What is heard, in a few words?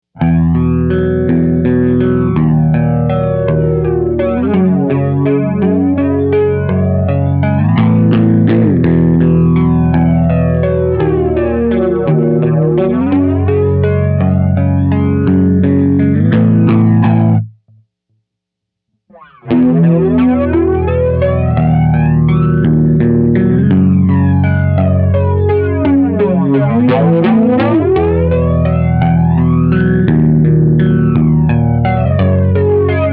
flanger; mobius